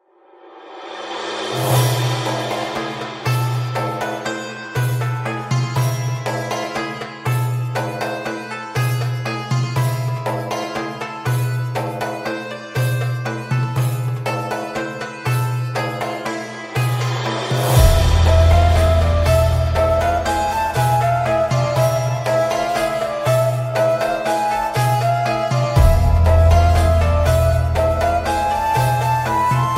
Eid Music ringtone

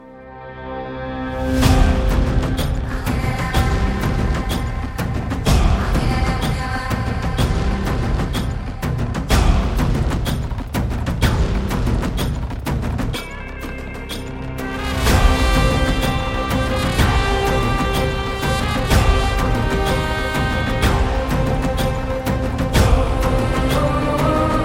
Trailer BGM Ringtone; Narivetta

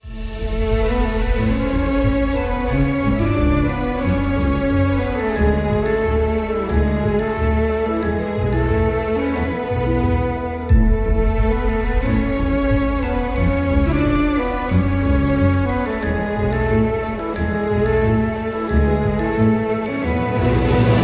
Sad Bgm

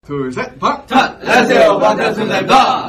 ringtone

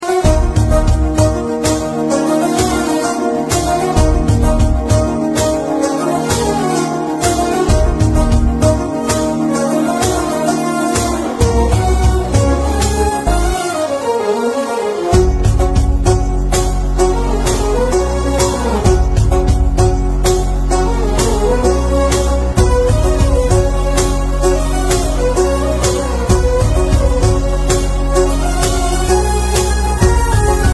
Instrumental

